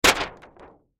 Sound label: Impact Plastic Sheet Hit Large; Sound Effects:Materials